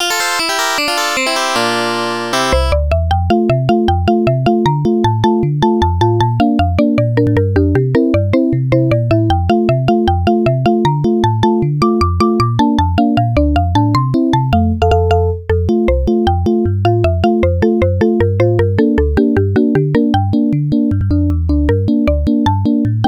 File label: arcade background music 1; bomb jack